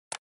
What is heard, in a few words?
OS Sound Mouse